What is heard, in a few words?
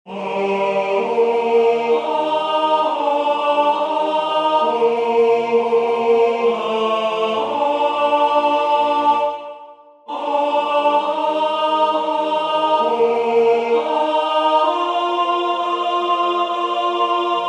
tenor; Waiheke Island Choral Society